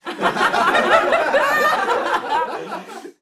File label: Voice Crowd Small Expression Laugh Stereo